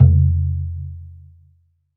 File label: BOOK, KOREAN PERCUSSION; AKAI S6000 CD-ROM - Volume 5